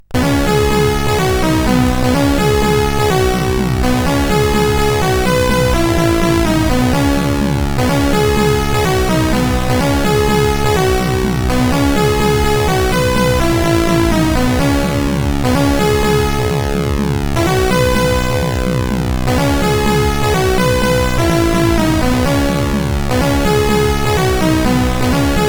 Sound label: Burp Intro, CSG8580R5